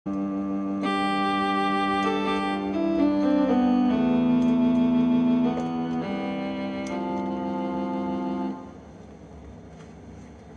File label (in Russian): синтезатор; Лапенко